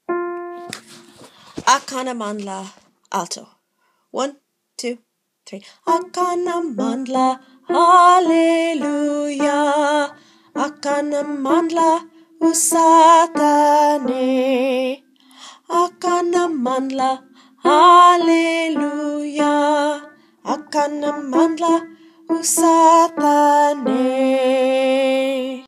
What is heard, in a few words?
Alto